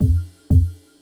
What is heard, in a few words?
Synth Bass; BB 2HTC Samples Vol 4